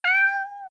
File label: Cat; Nokia 105